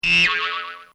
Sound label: Comedy Effect Spring; Funny Sounds; Bobby Cole